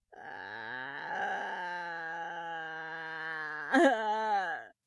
groan; devour

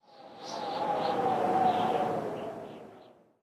Amb Winter Windgust

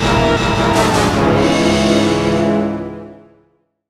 Jingle Failure

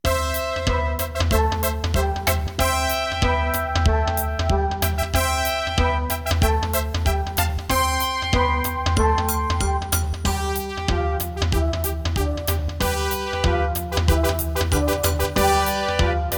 Dixie Drums

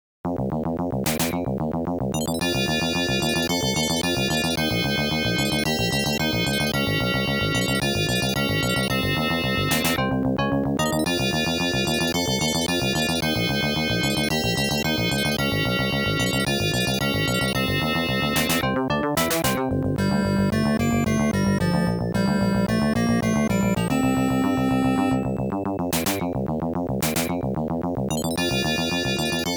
NIGHT BGM; PLUMP POP

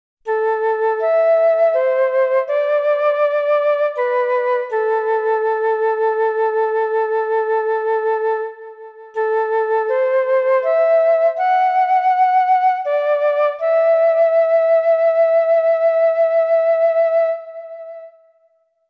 The Melody of the Specter's Flute; Professor Layton and the Last Specter

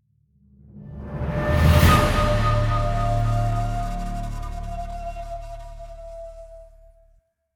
Monster Hunting Combat Ending; Skellige

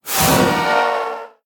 Ghost Pain; Devour; The Town

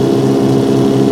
Eleanor High

